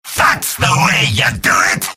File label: positive vocalization; demoman; video games; source engine; tf; mvm